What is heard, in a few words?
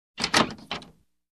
Old Wooden door close